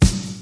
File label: raw snare sample c key, Royality free snare single hit tuned to the C note; 80s